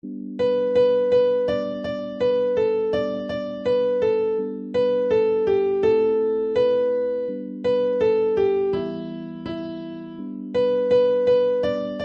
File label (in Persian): کیبورد